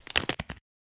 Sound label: Break Bone Med; Osteotic Bypass